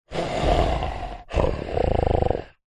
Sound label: horror monster snort nasal not aggressive